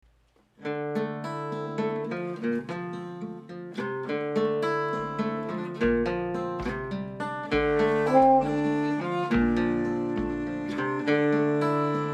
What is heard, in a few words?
Eastern theme guitar and violin